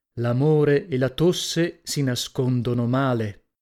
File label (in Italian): tosse; ritratto del diavolo